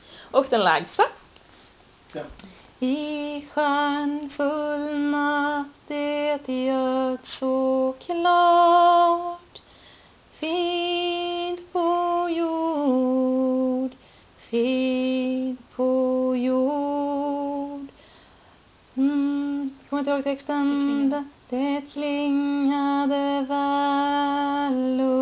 Frid på jord understämma

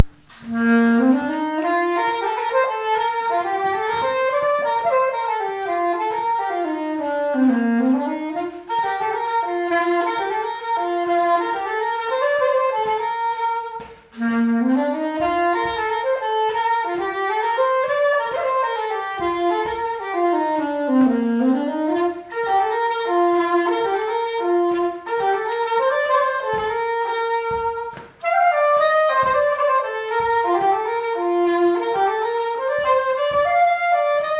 polska; Den glade pols

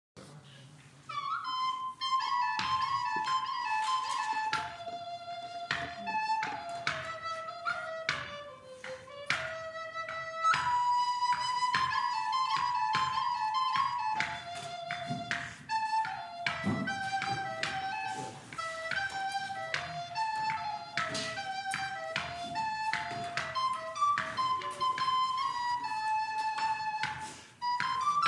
flöjtjam

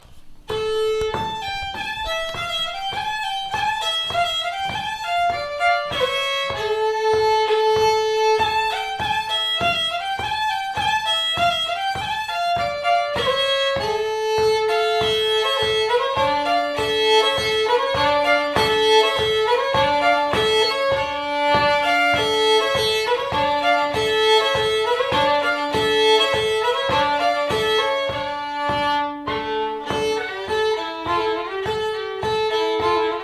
Polska; efter A G Andersson

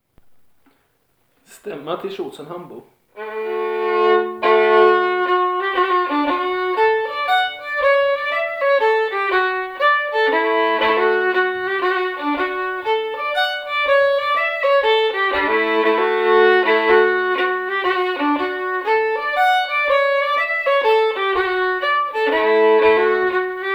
hambo stämma; Tjosan

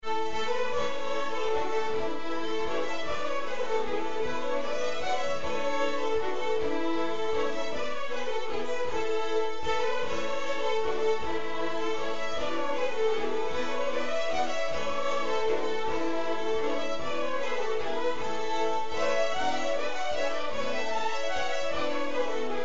din vals

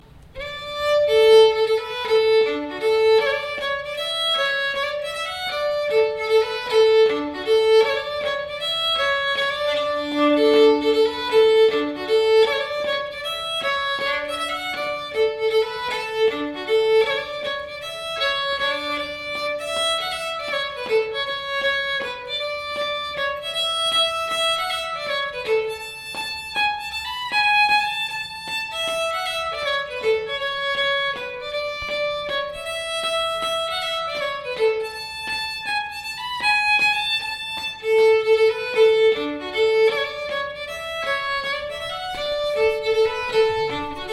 mazurka; Vallkärra

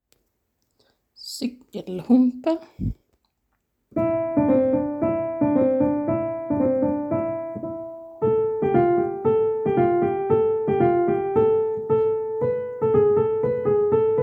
Cykelhumppa

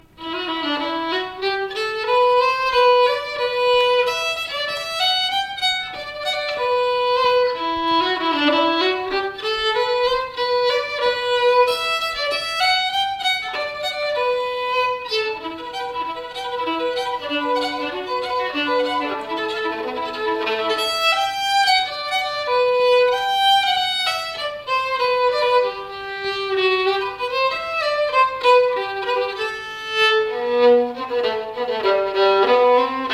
Slängpolska fr Mörkö

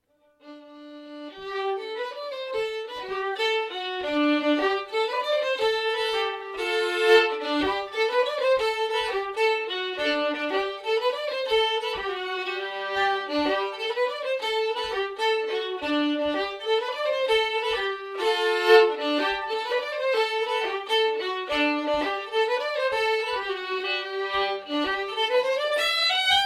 Resårpolskan

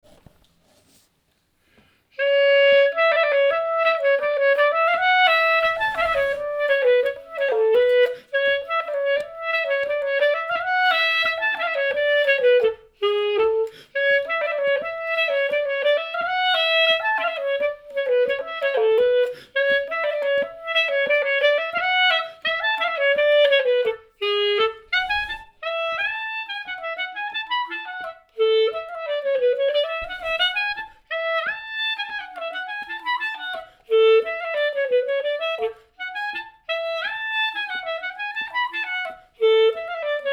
Fransk schottis

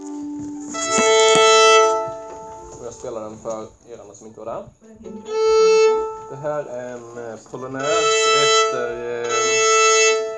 polonäs småland; Polonäs e Grevelius, Småland; Slängpolska

